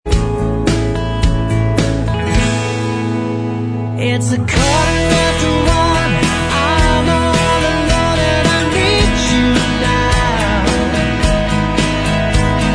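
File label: instrumental track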